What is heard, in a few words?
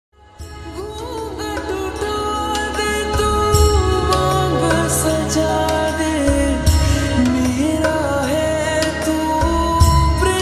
Slowed Reverb Ringtone